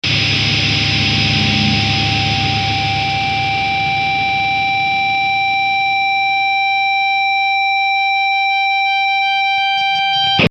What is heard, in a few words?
feedback